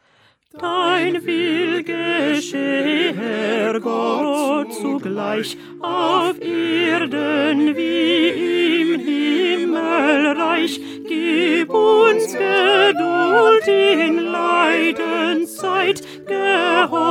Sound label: Alto